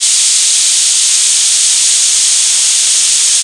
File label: Spray; subway surfers city sound effects; Android, iOS